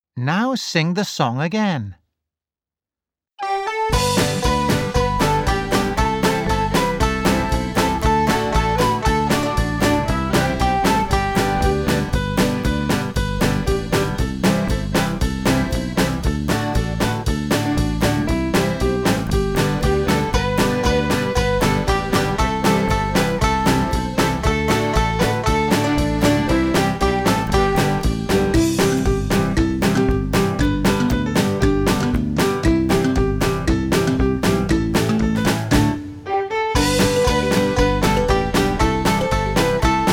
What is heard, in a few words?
Karaoke; Super Minds Level2